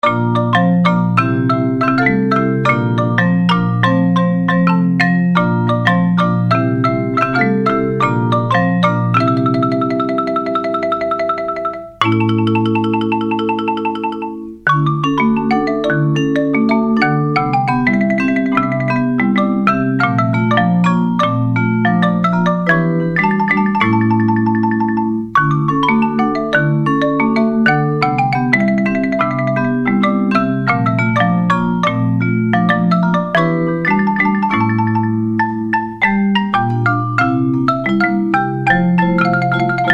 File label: samba